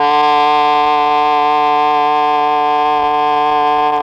MEL OBOE

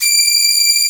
STRING SECTION D6; STRING SECTION #2

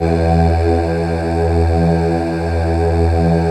voice; Greytsounds; F2-SYNCLAV 2

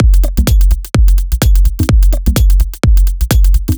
C 127bpm, House Drumloops; Zero-G House Fabrik Drumloops; ZG Drumloops

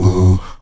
VOX AHOO, Afro Chants, Stacc Ah Oos

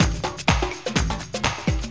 Dance Grooves; Best Service; Dance Mega Add On Grooves

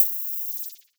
HH, Dance Drums

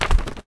Book Pages, household rhythm samples Hits

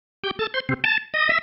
Single Riff, Organ

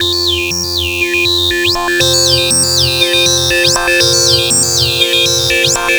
Motor Arp Bass; Sonic Foundry (Sony Creative Software); The Electronic Music Manuscript Collection; Bass Foundations

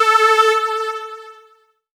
lead syn, Techno Trance; Ueberschall; Techno Trance Essentials; SYNTH

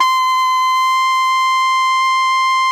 ALTO FF C 5, saxophones; Greytsounds; Philip Wolfe David Crozier's Saxophones

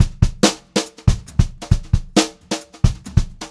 Brushed Drum Loops; USB Soundscan vol.39; AKAI; HOTROD

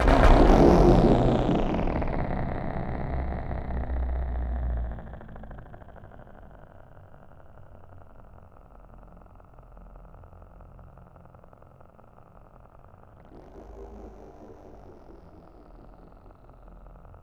C2, SynthLine; 90 s Sample CDs; NiceBeats; SynthLine VOL-7

